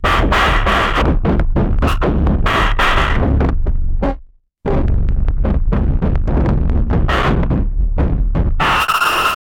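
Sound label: metal clangs guttural roar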